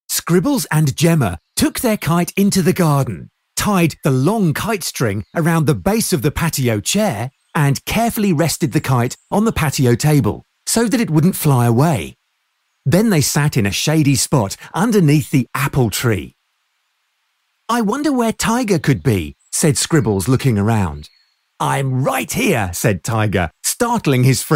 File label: Violins Magical Melody; Audiobook Producer